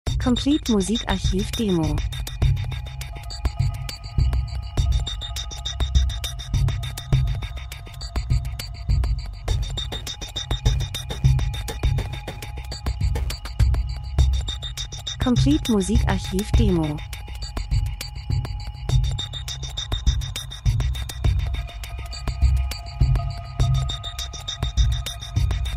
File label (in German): drums, rhythmus; Variation Percussion Spannungsaufbau Reisen Fernweh Traditionen Land und Leute Reisefilm Natur Dokumentation